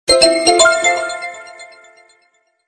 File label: cool notification tune